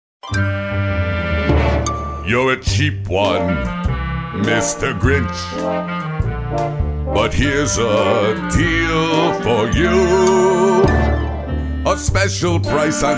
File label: Singing